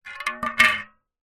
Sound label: foley tin small empty no lid movement on hard ground; zapsplat